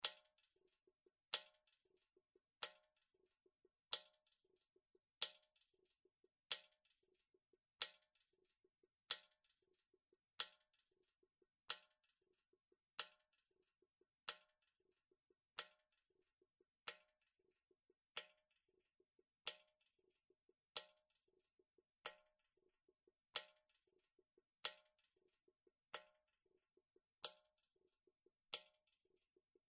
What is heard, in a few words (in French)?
Metal